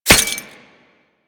Helmet Impact; Warframe

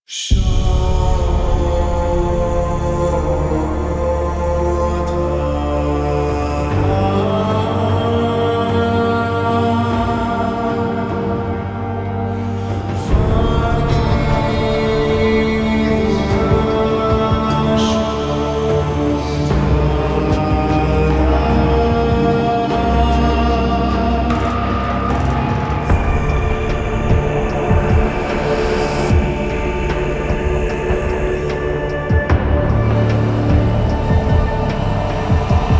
Lab Reveal Cue, Music; Warframe; DE; Entrati; TennoCon2023

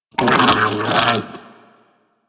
Armadillo Vocal Snarl; Warframe; Hunting Critters

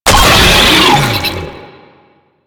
Infested Player Spotted; Enemies; Vocals; Warframe; Proto Infested; Brachiolyst

